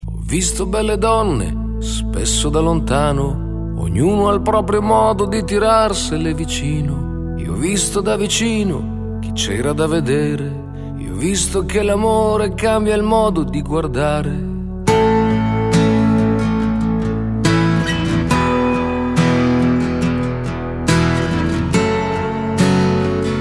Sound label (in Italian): Atto di fede in acustico